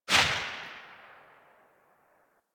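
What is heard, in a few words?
submachine gun Tail; Warframe; Tenno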